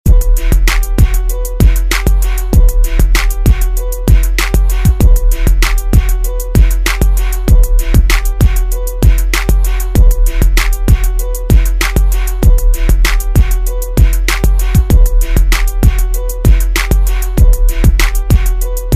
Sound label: Hiphop Redrum